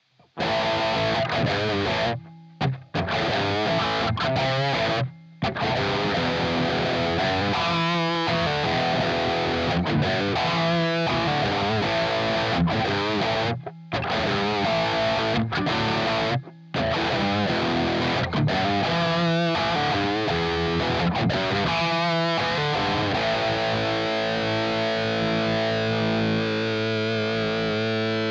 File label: Blackstar St. James Big Rhythm